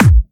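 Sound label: BD Clubby, bass drum; VEC1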